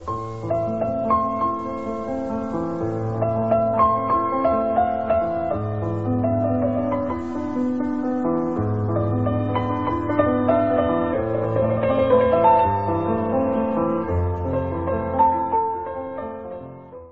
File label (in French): piano triste